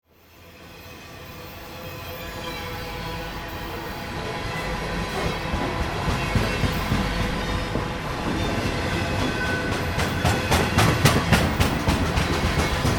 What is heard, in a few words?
winter freight